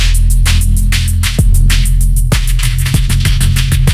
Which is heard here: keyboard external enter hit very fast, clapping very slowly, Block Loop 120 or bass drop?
bass drop